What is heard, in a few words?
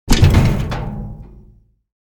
metal plate rattle